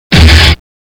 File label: Wave start